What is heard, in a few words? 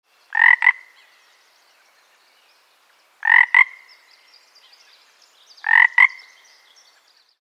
Single frog croaking close perspective